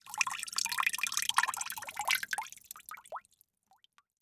Liquid Water Dripping Into Water In Plastic Bucket Short Slow